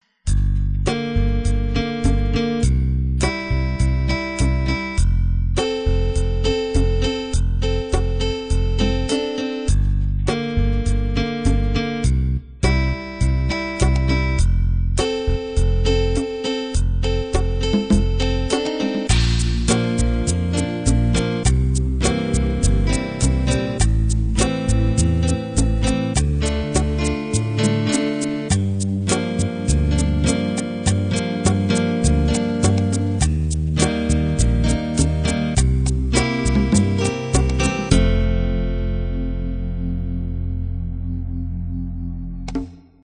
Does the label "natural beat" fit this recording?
Yes